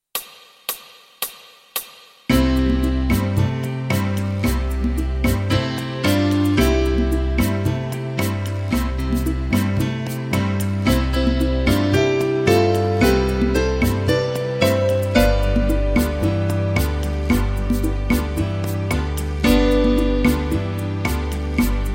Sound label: Piano tutti